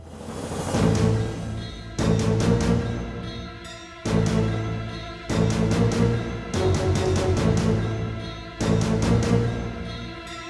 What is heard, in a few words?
boss dead; shinagawa; Shin Megami Tensei Imagine gamerip